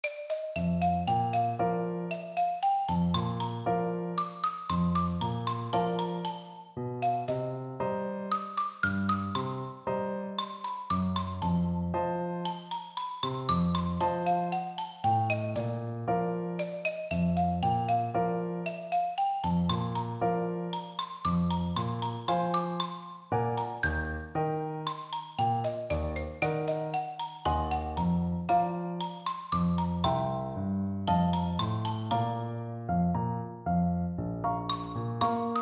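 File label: xylophone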